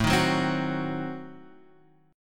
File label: A Diminished 7th, Guitar Open F; Adim7 strummed; x,4,3,1,3,1; JGuitar